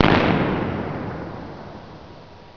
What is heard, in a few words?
GUN SHOT